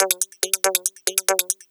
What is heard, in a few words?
Loops 140 BPM Hihat Percussion Loops